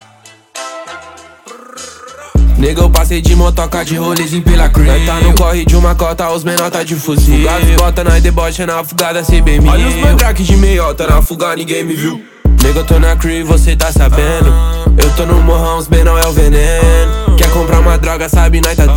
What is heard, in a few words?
music